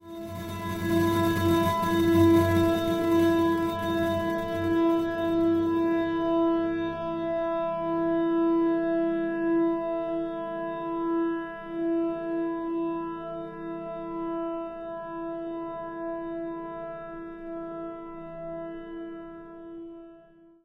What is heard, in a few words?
grains guitar